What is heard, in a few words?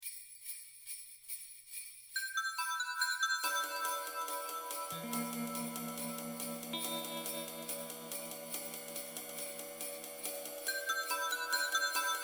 Horror Attack